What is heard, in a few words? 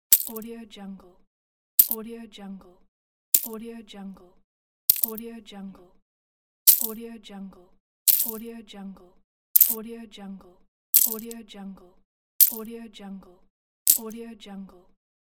coin